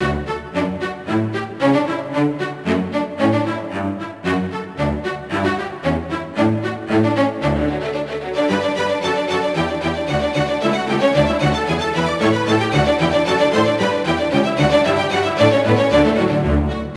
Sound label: Amb Classical